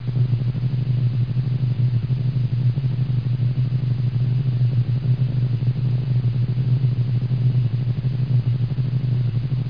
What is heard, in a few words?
Drone; Weird - The Truth Is Stranger Than Fiction